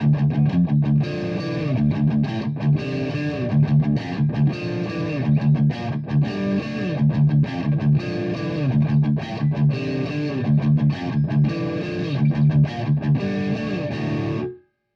Verzerrer Pedale Mid Gain; Revv G3

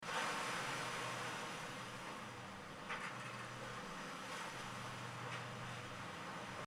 DPA 4488 Cardioid Fischkutter Background only

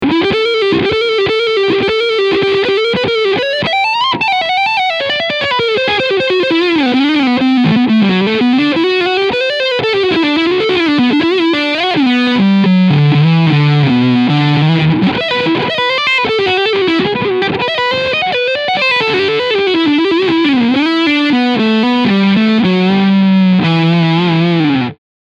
Jackson Pro Soloist SL2Q E Gitarre Overdrive Sound Front Pickup

